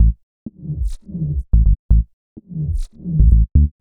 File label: 126 A BASS, Funky Underground bass loops; Loopmasters; Funky Underground Vol 2